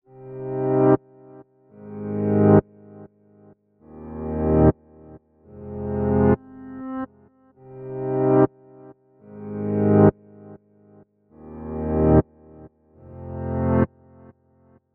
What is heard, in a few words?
Piano Reverse EDM Chords Cm 128; Sample Banks; Melody; Keyboards